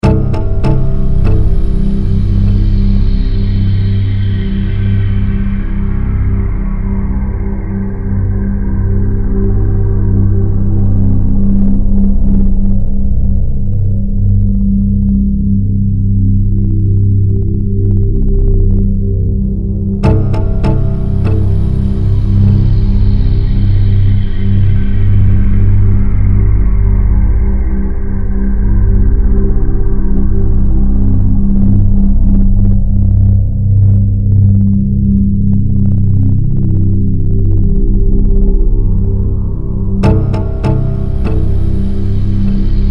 Synth Drone Musical, Sweeper, Horror; Synth, Drone, Musical, Sweeper, Horror, 5.1; BlastWave

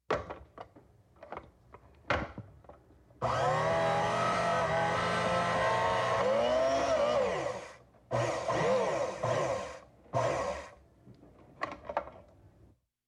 Electric Can Opener; Electrical; Sound Design

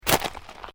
Footsteps Boots Volcanic Rock Step

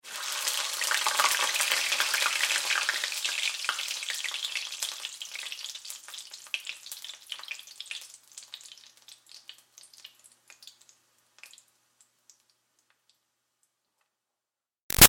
Food Sizzle Oil French Fries Splash Light; Household

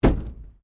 WRECK MINOR CLUNK, Wrecked Cars; impacts, crashes, scrapes; Crash Sound Effects; Crashes (Sound Effects:Objects)

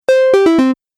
Stage Level Complete; Video Game Sound Effects

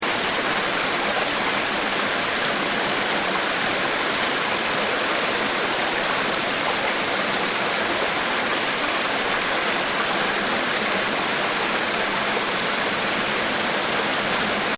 Stream, Rivers And Streams; Nature

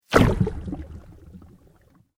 Sweep Organic Fast; Created sweeps, swooshes and other movement effects; Sweepers; Sound Design; SoundEffects-Foundation4

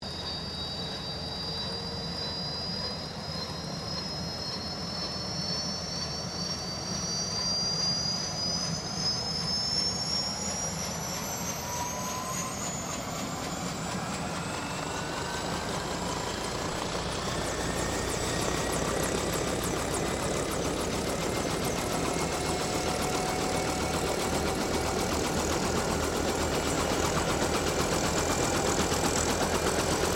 HELICOPTER 1967 BELL UH1 IROQUIOS STARTUP; RAN UH-1 Iroguios; Vehicles; Aircraft; Helicopter Sound Effects; Helicopters (Sound Effects:Vehicles)

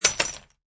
Ceramic Glass Break; Glass Sound Effects; LynneMusic

